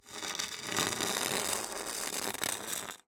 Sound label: ICE Hockey stick medium long scrape; Articulated Sounds; Ice Skating